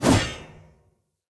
Player Sphere Polearm Whoosh; Weapons

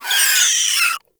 Forest Rodent Vocal Death; Vocals; Warframe; Characters

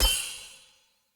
Enemy Weapon Magic Block Layer; Weapon Impacts

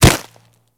step snow, Kubrow, Footsteps; Warframe

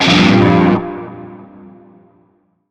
Shawzin Min Chord; Warframe; Narmer Shawzin

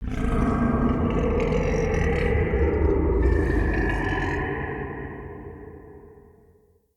Corrupted Ambient Roar; Vocals; Bromius; Animals

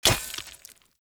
Short Sword Throw Medium Impact Rock; Player

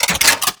Archwing Mach Gun Un Equip; Warframe

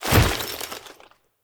Foley Jump; Warframe; Characters; Kahl

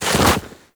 Walk Deep Snow, footsteps; Warframe; Operator

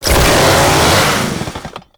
Saw Attack Rev Heavy; melee; weapons; Warframe; Grn Ghoul Saw Player; Kuva Ghoul Saw

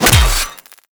Melee Hammer Impact Robotic Flesh; Hammers

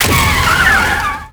Bike Damage

